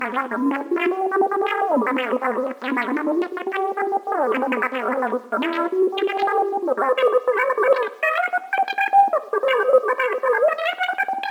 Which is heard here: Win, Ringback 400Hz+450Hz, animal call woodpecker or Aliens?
Aliens